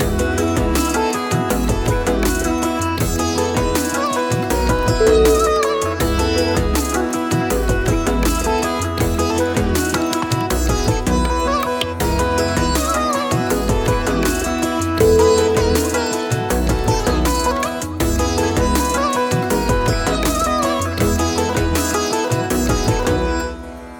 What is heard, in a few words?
sitar raga